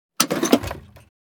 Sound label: Ammunition box metal case open; Military Equipment